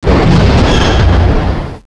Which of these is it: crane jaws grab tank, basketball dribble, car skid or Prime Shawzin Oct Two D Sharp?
crane jaws grab tank